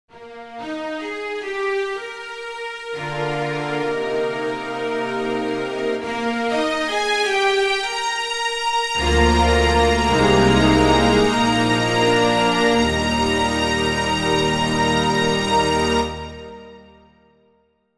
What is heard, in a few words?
Yamaha Genos2 Filharmonic